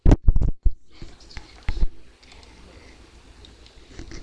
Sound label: zipper